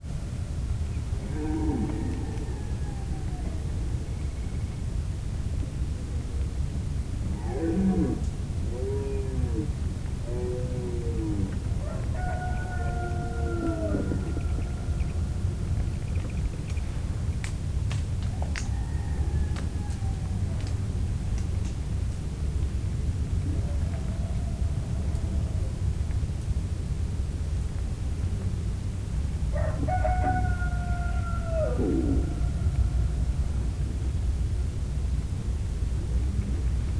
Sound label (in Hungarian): kakas távolban gímbika vadászlesről; parasztudvar